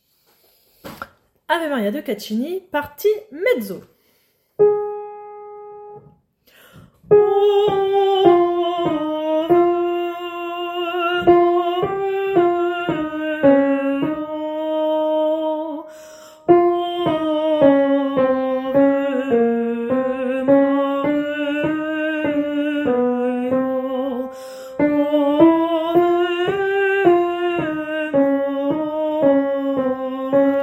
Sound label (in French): Ave Maria partie mezzo